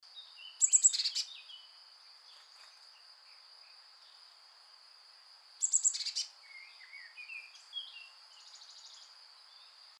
alarme mesange

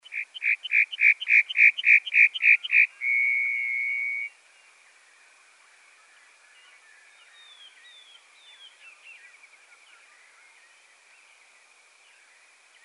bruant

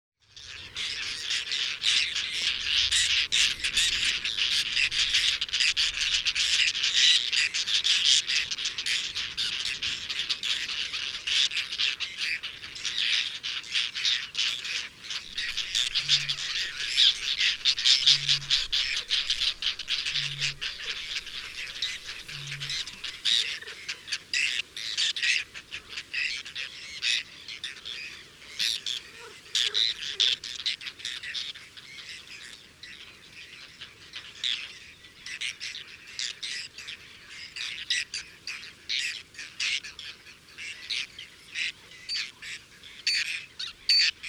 PAYSAGE JOURNEE

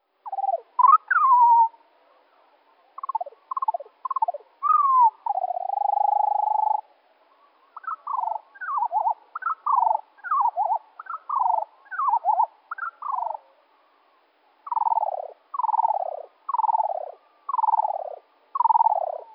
alouette moins deux octaves; Son chant ralenti de deux octaves

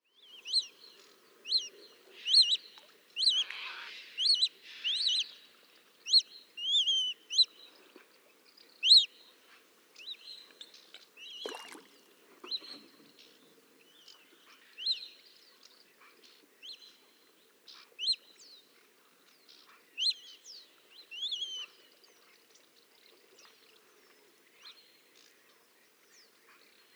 sterne caspienne

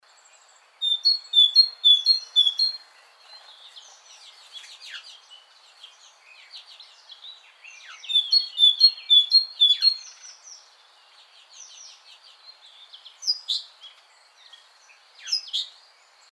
chant type mesange

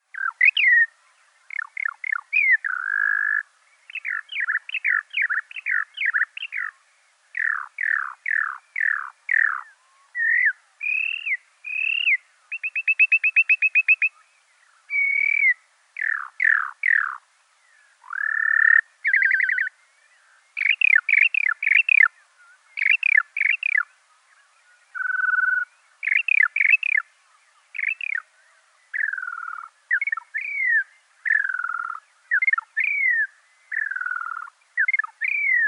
ALOUETTE RALENTIE; LE CHANT EN VOL AU RALENTI

